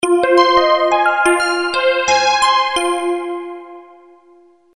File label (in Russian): Звонок; Motorola MPX200